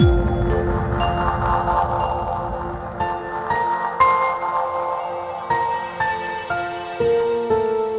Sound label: MUSIC INTRO; Diary Girl